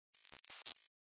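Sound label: signal glitch; افکت صوتی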